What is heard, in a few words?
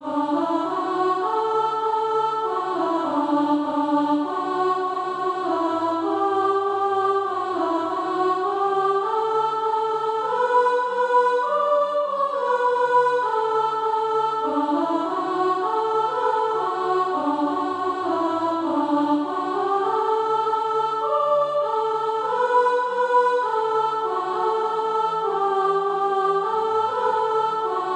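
soprano, Practice Music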